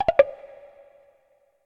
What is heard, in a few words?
match leave; Luxariah Default Minimal